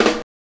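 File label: snare drum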